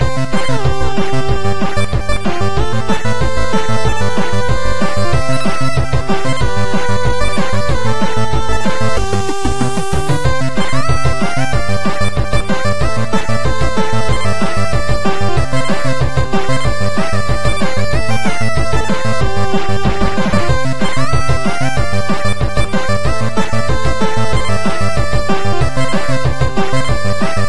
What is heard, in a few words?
credits music; usbloadergx